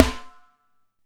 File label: Snare, Alesis DM5